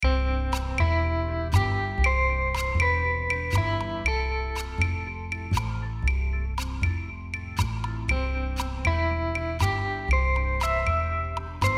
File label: iphone ringtone